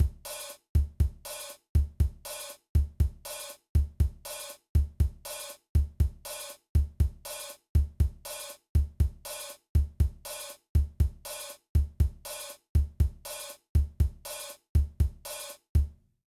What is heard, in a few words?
Bossa Nova Beat, drum